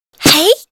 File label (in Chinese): 女起跳; renlei